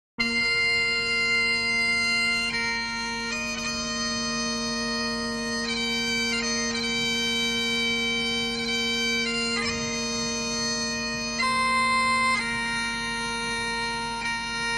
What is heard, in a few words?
pipes